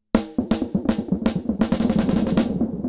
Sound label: Delay TIME MODULATION LEVEL 50.0 WAVEFORM notch